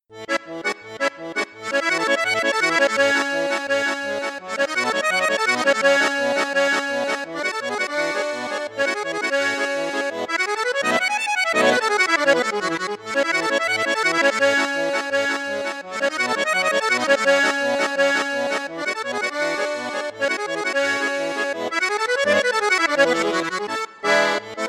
registre basson flute juste flute haute, accordeon; piermaria 312

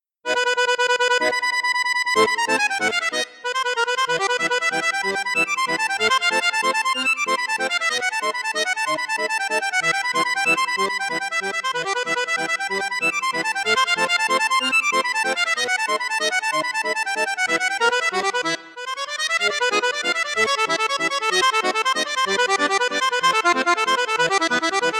registre flute juste piccolo, maugein mini sonora accordeon

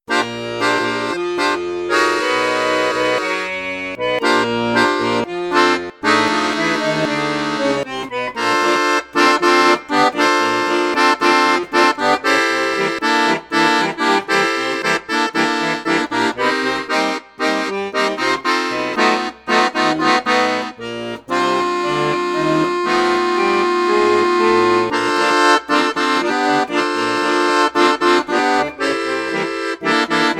registre flute juste, accordeon; perle d'or

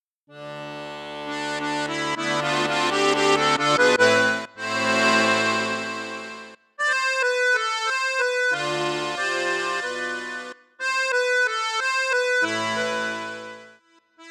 registre flute juste flute haute, fratelli crosio super pro accordeon

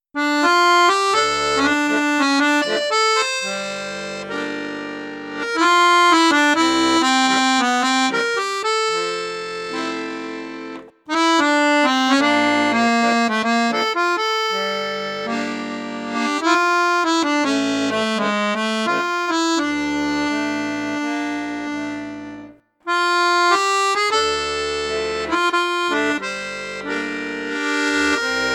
registre flute, accordeon; pigini star jazz

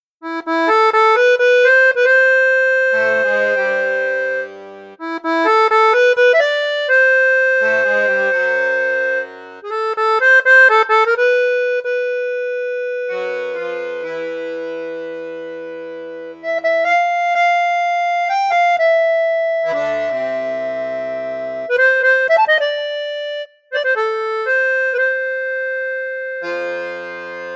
registre basson, accordeon; excelsior

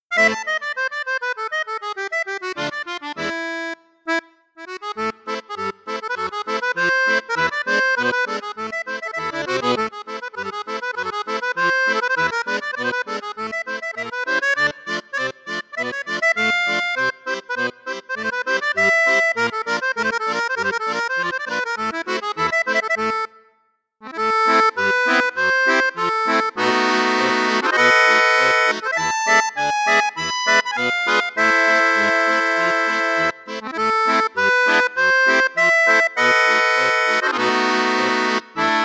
registre flute juste, accordéon; paolo soprani

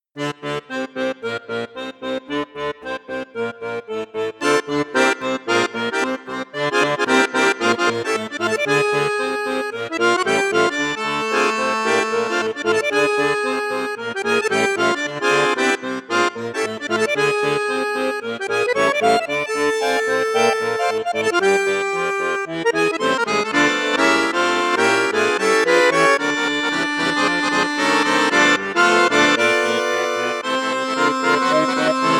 registre basson flute juste piccolo, maugein mini sonora accordeon

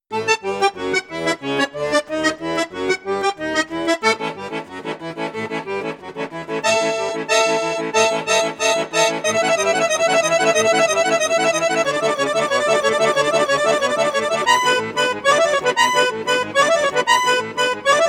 registre basson flute juste flute haute, accordeon; cavagnolo virtuose 4

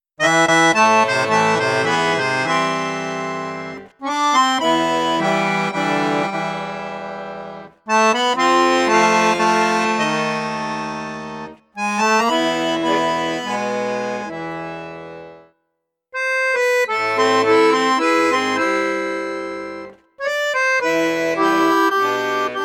registre basson piccolo, accordeon; crucianelli super king

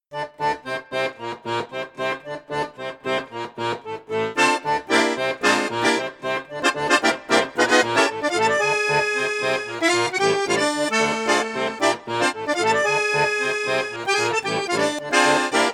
registre basson flute juste flute haute piccolo, accordéon; cavagnolo virtuose 4

